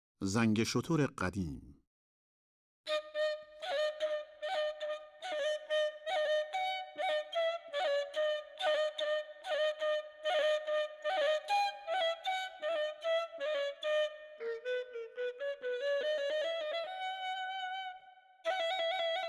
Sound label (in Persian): Zange shotor Segah Radif-e Ney, Dastgah Segah